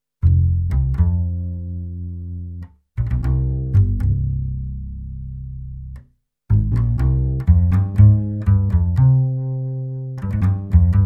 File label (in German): Bass mit MC DIM normalisiert